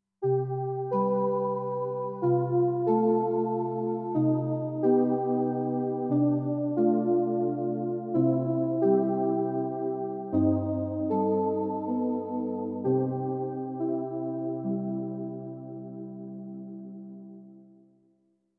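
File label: Hollow Release; XG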